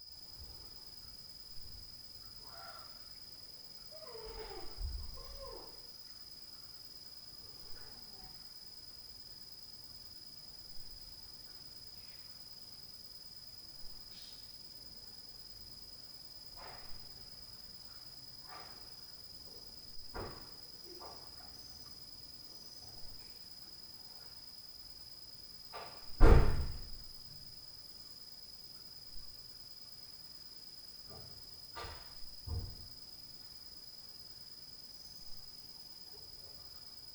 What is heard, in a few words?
MOTO START; Night Noise